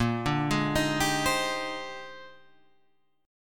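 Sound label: A sharp 13th chord, Guitar, Half Step Down tuning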